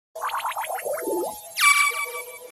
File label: death tone a3; sao abridged